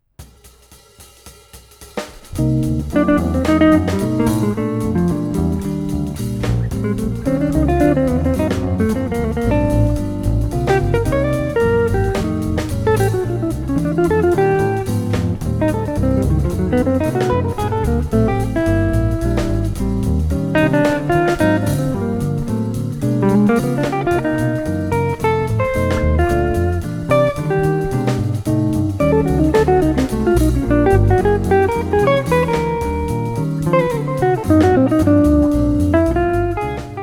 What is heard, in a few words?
autumn melodia